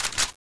m4a1 bolt pull

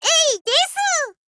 jump

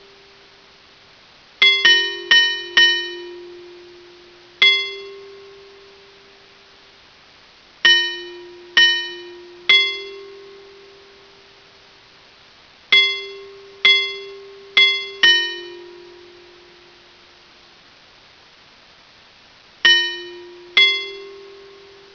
bells zombie

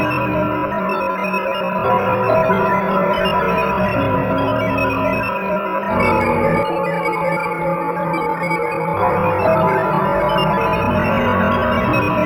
dark, ambience music

